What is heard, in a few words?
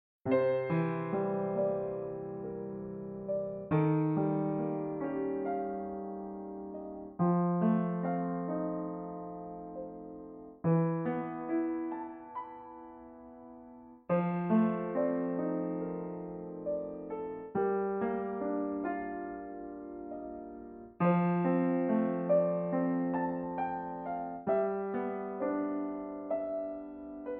Piano